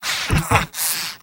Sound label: scout laugh evil; vo; tf2; mvm